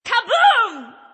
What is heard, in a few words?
Kaboom; Smite Sol